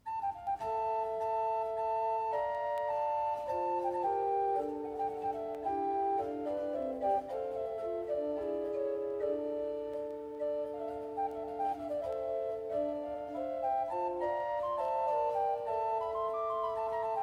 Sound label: Buxheimer Orgelbuch, organista; Salve Regina; Livio Vanoni